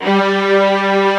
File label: ARCO STRGS M; OMI - Universe of Sounds